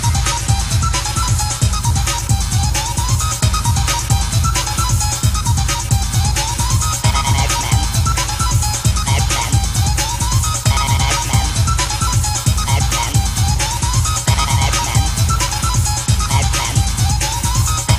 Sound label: Pacman Techno